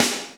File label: snare ROCKE, Roland; Sn Modules 7; Roland L-CD701; Snares 7